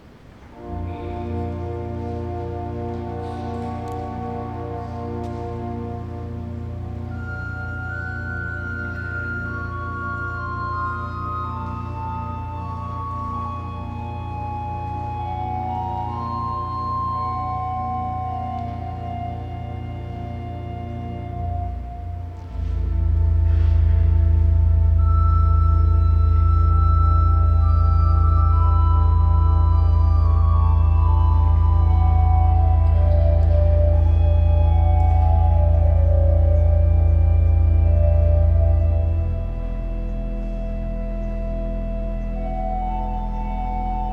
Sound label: Organ interlude; Colloquium; Vespers